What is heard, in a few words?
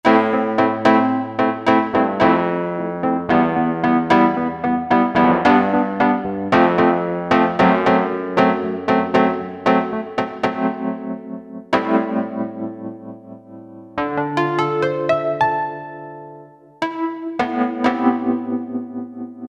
Ep Reed; Piano - Keyboards